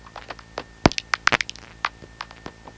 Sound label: bat